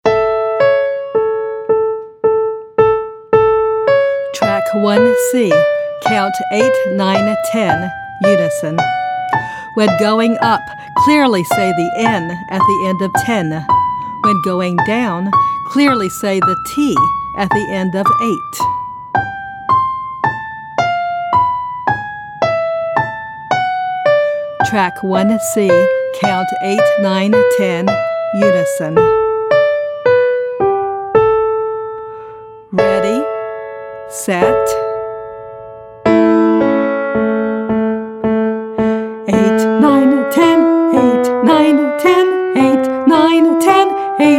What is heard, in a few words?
Warmup Count